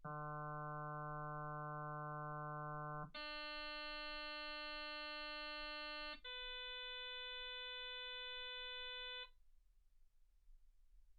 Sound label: harm sinus 48kHz